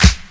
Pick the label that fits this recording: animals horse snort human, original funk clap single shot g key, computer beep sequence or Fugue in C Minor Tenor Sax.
original funk clap single shot g key